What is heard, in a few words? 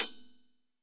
piano